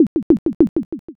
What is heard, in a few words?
slot stop